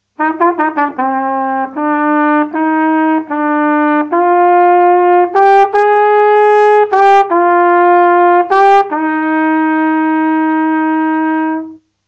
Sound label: Trumpet